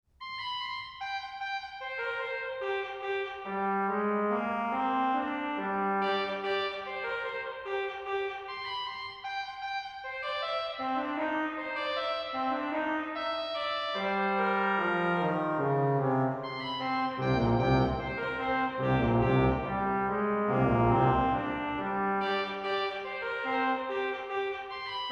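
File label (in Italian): fanfare, organist; Fanfare RPS (2017); 1) Fanfara in imitationis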